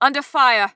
Taking Damage; Hunter; Realm Ops; Realm Royale

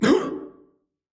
Warrior Grunt Hit; Realm Royale; Archangel

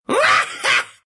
laugh, ru; dota2

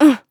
Mage Grunt Jump; Technomancer; Realm Royale

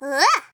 Grunt Attack

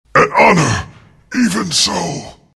husk death; huskar

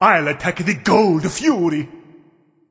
Self Attack; Brynhildr; Bacchus

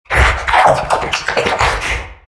lipsmack; Undying

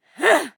Hunter Grunt Physical; Realm Royale; Realm Ops

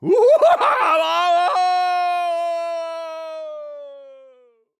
Falling; Torvald

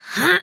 grunt attack; Paladins; ChurchHill

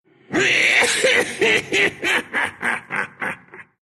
laugh, ru; Dota 2

